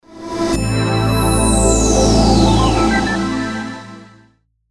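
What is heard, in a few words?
Soundlogo Instrumental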